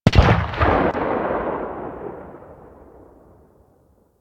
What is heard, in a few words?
Weapon Enemy Tail Outdoor; Warframe; Weapons